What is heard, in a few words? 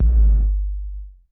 GT K808, GATE COLLECT; Masterbits - TR-Minator